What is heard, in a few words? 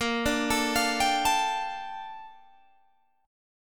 A sharp Major 13th, Guitar Open G